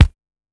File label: Kick Loud, classic machines dx